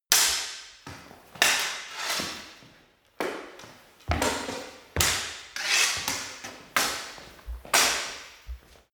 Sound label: sword hit sword sequence